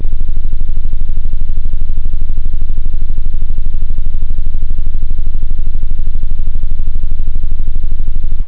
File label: synth bass; da capo vol1; instruments